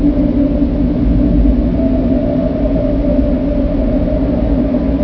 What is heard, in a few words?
windy man